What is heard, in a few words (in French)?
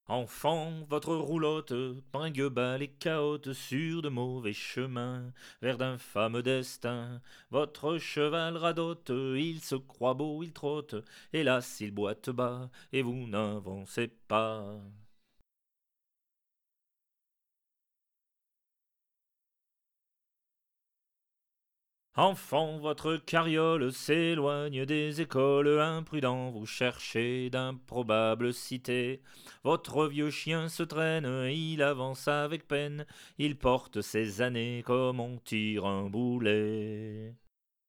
VOIX